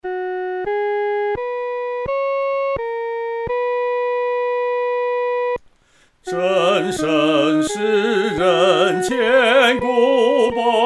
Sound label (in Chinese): 独唱（第一声）; 千古保障